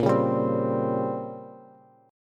Bb Minor 6th Add 9th Bb down Bass; strummed; JGuitar

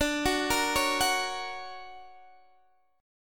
D Augmented Major 7th down Guitar Half Step Down; D+M7

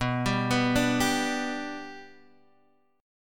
B Minor Sharp 5th, Guitar, Half Step Down

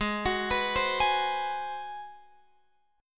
Ab 7th Sharp 9th, Guitar, Open F; x,15,18,18,15,15; arpeggiated

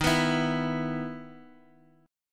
E 5th Eb; E5/Eb strummed; JGuitar

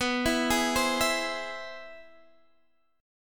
C Augmented Major 7th B

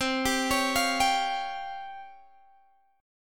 Db Major 7th Flat 5th C

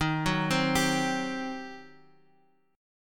G Major 7th Suspended 4th Sharp 5th, D sharp

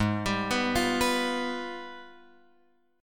G sharp Minor Major 13th; G#mM13; {4 X 5 4 6 7} arpeggiated

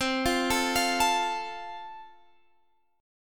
F Major, C

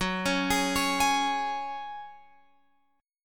F sharp Suspended 2nd, Guitar Open F; arpeggiated

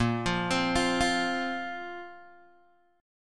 A sharp Suspended 2nd down Guitar Open G

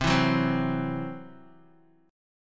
C Major Flat 5th, C, down, Guitar; strummed; CMb5

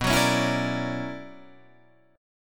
B-Minor Major 11th B down Guitar Drop D

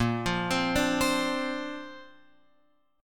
A sharp Major Add 9th, Guitar Open F; arpeggiated

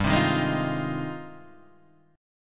G sharp Major 13th

